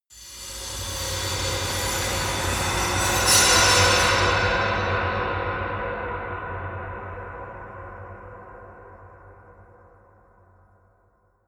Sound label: eerie industrial swell screech